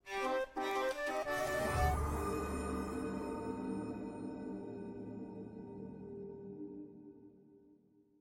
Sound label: Gem Sold; Devils Roar